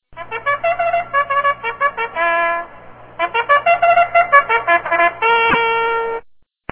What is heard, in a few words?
bugle; alertViz